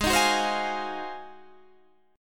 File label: Ab 13th Ab Guitar Standard; strummed; JGuitar